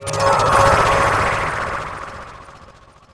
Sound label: Blink